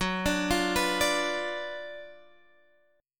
F sharp Major 7th Suspended 4th Sharp 5th, F sharp, down; F#M7sus4#5 Chord; arpeggiated